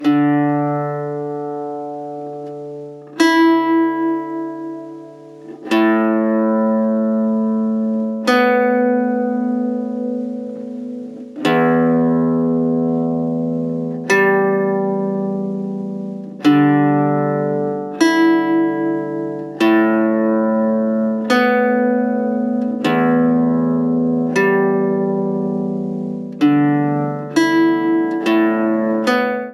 Guitar Alarm Ringtone

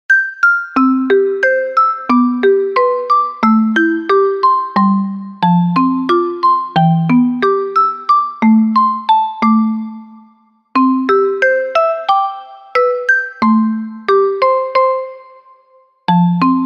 Xiaomi Miui Ringtone Crystal

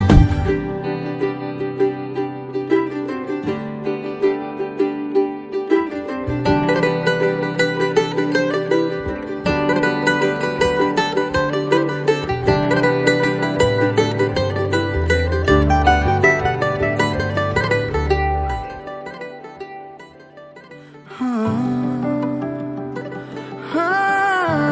Wedding Hindi Ringtones

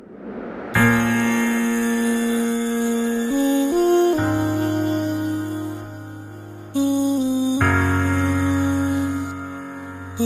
Islamic Instrumental Ringtone

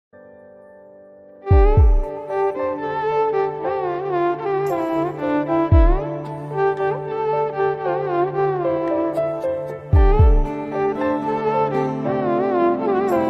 Old Hindi Violin Ringtone

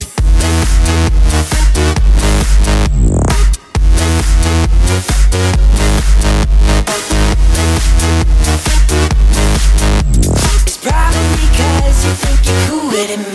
tones; Oppo

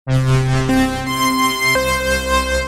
Pad Sms Cool

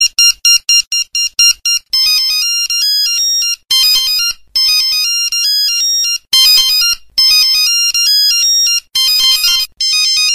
Samsung Galaxy Tab Default Ringtone

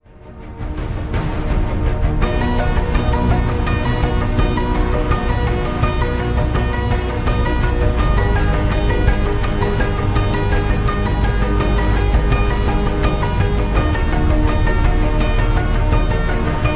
Ringtone; Samsung

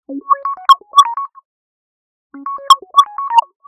Sms Ringtone

